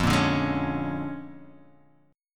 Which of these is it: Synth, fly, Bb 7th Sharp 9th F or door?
Bb 7th Sharp 9th F